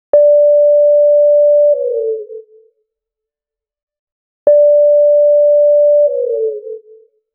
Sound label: a very short twitch alert, one note